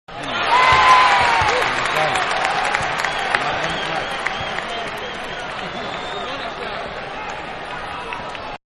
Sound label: Crowd Approving; Crowd-Cheering; Crowds (Sound Effects:Backgrounds)